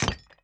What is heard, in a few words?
mine stone